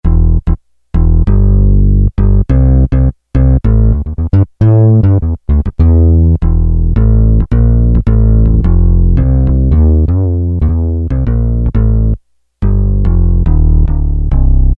Fingered Bass, Roland W-30; Factory Performance Patches

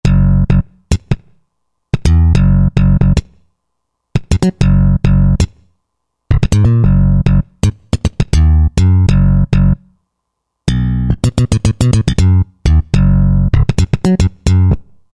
Slap Bass, Roland JD-990; Factory Patches

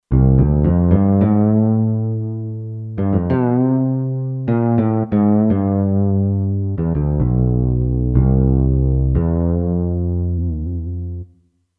Synth Fretless, Kurzweil K2500X; Factory Programs

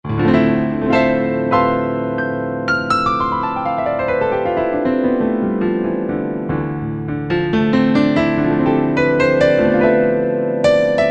stereo keys, Korg N364; Factory Combi demos

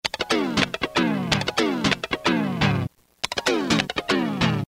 Guitar Fill; Roland M-DC1

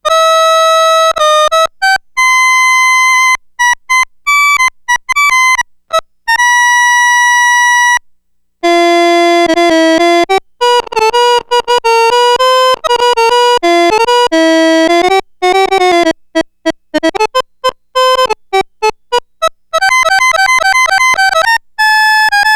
Accordion, Roland SH-2000

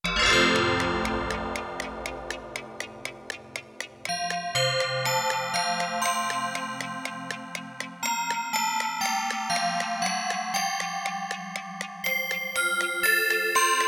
Clockworks, Korg Radias-R

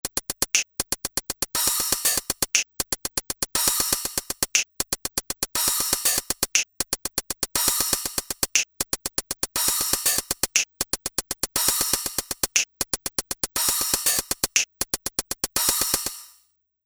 Click Cymbal

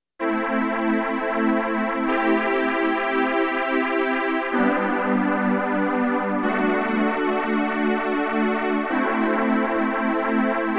Classic '80s Synth-Pop Pad, Oberheim OB-8

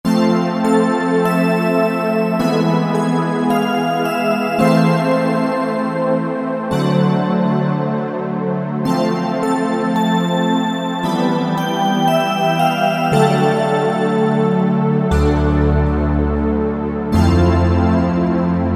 Bell Vox, Roland V-Synth; Factory Patches

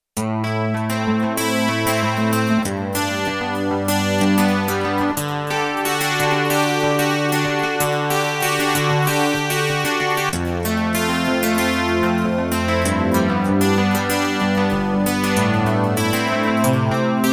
MIDI Guitar, Roland JD-800; Factory Patches

